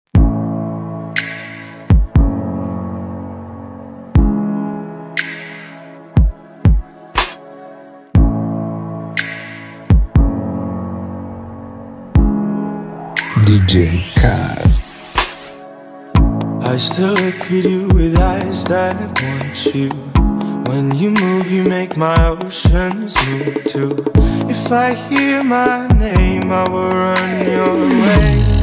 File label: bachata remix